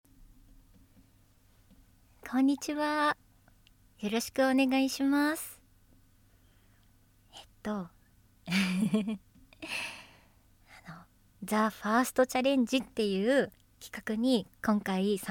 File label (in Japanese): 普段は真面目なナレーションしてるお姉さんの全力オナニー実演おしっこ漏れちゃう; 一般声優の襲来！; THE FIRST CHALLENGE